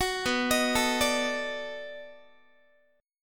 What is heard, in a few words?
B 7th Sharp 9th, down, Guitar; B7#9; arpeggiated; 21,19,18,19,18,x